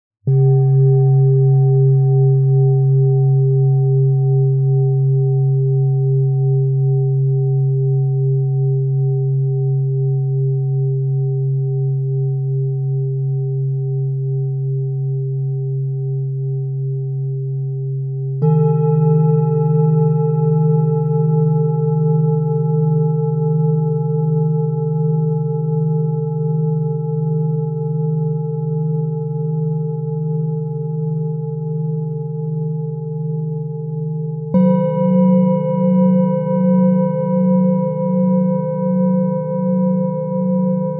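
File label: Antique Pentatonic Fundamental Set Strike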